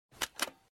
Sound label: oppo f1s cover ringtone